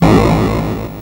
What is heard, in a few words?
Atari Noise Long, Atari 2600